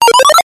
multimedia game sound arcade retro 8 bit action jump ascend